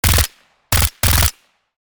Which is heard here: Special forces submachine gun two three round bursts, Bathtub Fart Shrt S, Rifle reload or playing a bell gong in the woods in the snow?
Special forces submachine gun two three round bursts